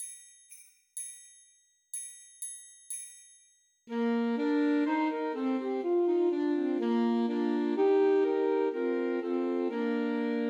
Ding Dong Merrily on High, Christmas Trios for Three Alto Saxophones